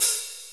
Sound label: open high hat sample d sharp key